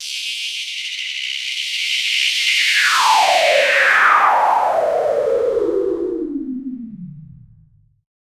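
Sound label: synthetic sweep with granular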